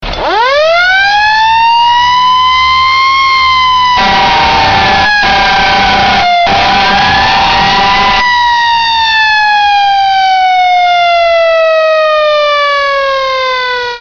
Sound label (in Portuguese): sirene de bombeiro